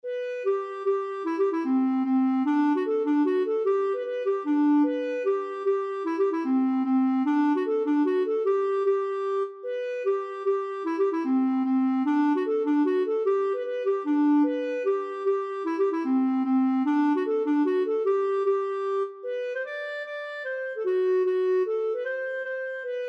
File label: Mazurka; Syversins